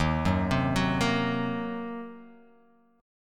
Eb Minor 6th, Guitar, Open F; arpeggiated

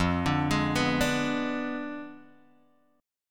F Diminished 7th, Guitar, Drop D; 3,5,6,4,3,x

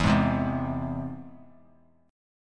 E Augmented; E+ strummed; JGuitar

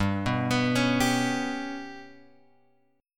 G Major Flat 5th chord; GMb5 Chord {3 2 X 4 2 3} arpeggiated

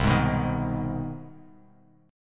C Minor Major 7th Sharp 5th, Bass Standard; CmM7#5 strummed; JGuitar

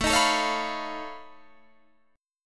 B 9th Flat 5th A

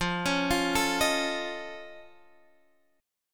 F Augmented 7th F, chord; F+7 arpeggiated; x,8,11,10,10,11

